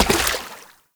Step Water, Foley; Warframe; Oraxia

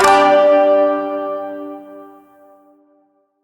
Shawzin Chromatic Chord E; Chords; Warframe

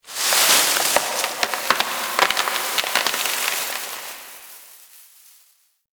Exterior Tree Wood Creaks Angry; Duviri

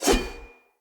Sword Whoosh; Weapons; Player; Swords; Fenn Jotar Sword

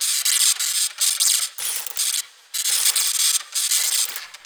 Foundry Build Loop; Warframe; Ambience; Nineteen Ninety Nine; Interior; Tailor Shop

